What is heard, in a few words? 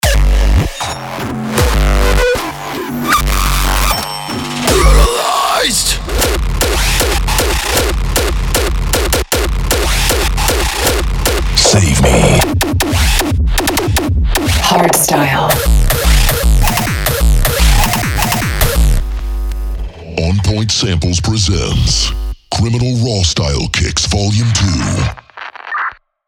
Rawstyle Kicks; Criminal Rawstyle Kicks Vol 2